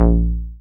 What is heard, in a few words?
303, TB-303 BASS